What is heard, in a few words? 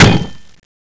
knife hit; cstrike; JailBreak; Janus